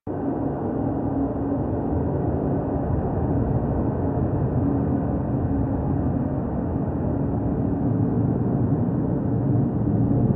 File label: Space Amb A, Ambience; Warframe; Tenno